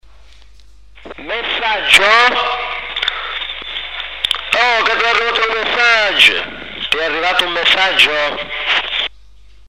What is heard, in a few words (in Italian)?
arrivo di messaggio